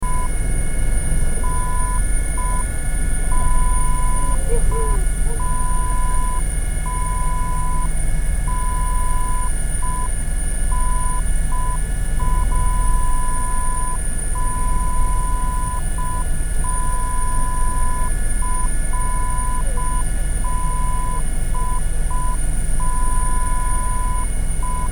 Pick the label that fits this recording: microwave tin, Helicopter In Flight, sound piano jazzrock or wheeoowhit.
Helicopter In Flight